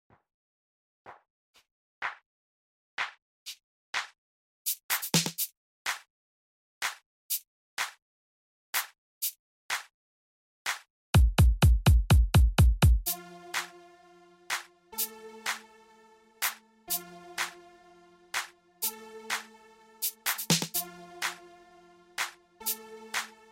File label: a short dankin beat